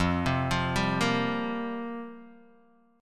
F Suspended 2nd Suspended 4th, Guitar, DADGAD; 3,3,3,0,1,x

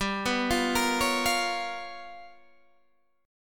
G 7th Sharp 9th Flat 5th G, Guitar DADGAD; 17,14,15,15,16,15; down

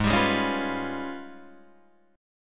Ab Minor Major 13th, down, Guitar, DADGAD

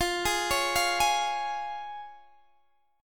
F Minor Sharp 5th F down Guitar Open D

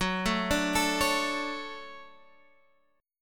D-Major 7th Gb

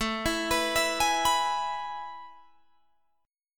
A Major 7th Suspended 2nd, Guitar, Drop D

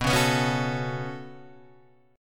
B 7th Suspended 2nd down Guitar Open G, chord

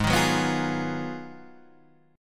Ab Minor 13th, Ab, Guitar, Drop D

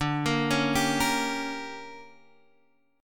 D Major 7th Suspended 4th Sharp 5th, chord

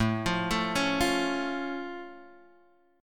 A Augmented A down Guitar Half Step Down

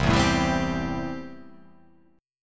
C 6th C down, chord

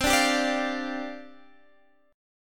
C Suspended 2nd Suspended 4th C Guitar Standard; strummed; capo 3; 20,17,17,19,18,x

